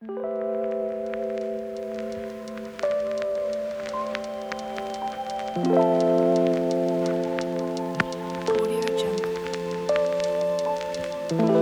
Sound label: chillhop lofi; densostudio